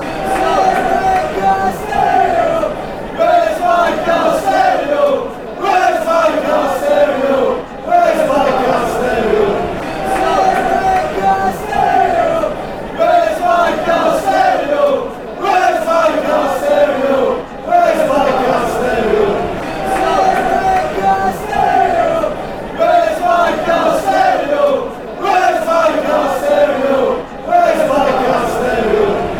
wheres my car stereo, chants

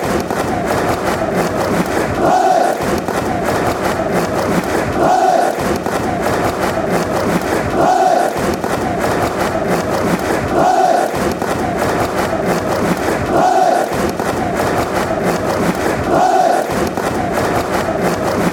clap, chants; palace

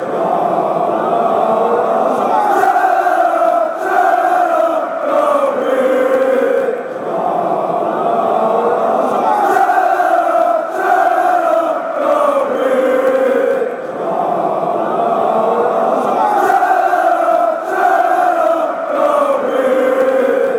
schalalala, chants

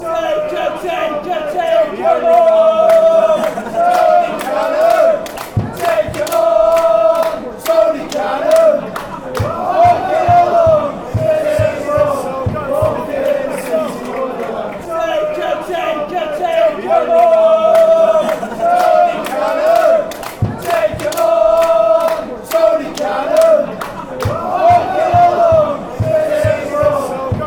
take em on, fanchants; york

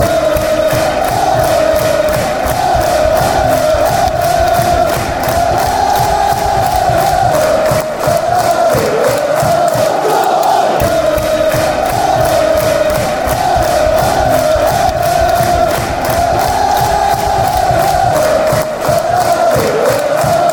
der der, chants; charlton

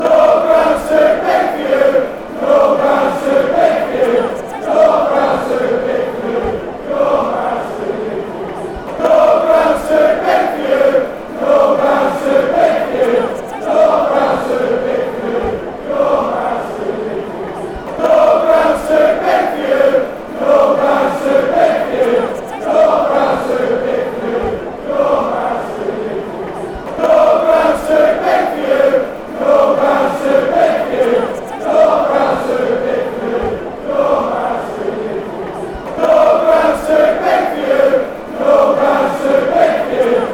your grounds too big, fanchants; southampton

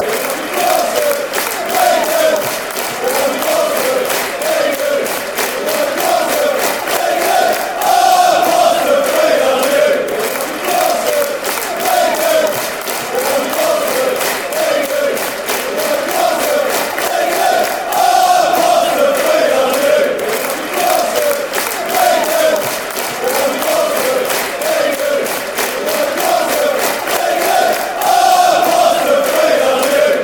we love you portsmouth, chants

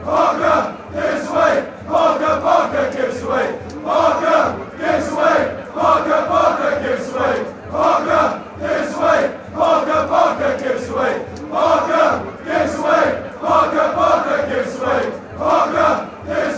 fanchants

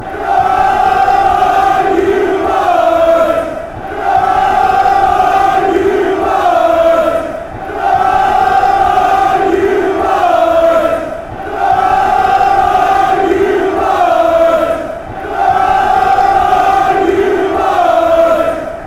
come on you boys, chants

